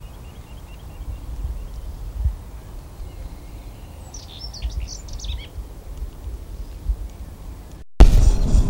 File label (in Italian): canti sterpazzolina